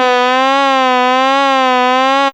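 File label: Trumpet Hi 2 C3, Fairlight CMI IIe Brass; Brass1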